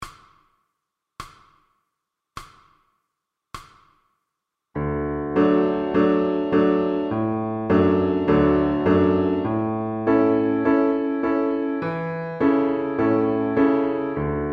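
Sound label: Piano Accompaniments for Violin; MTB Pre- Grade Introductory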